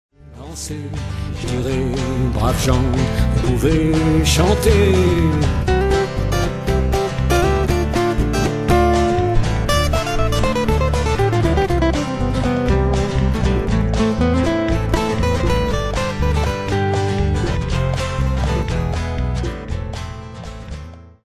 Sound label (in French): extrait mon vieux banjo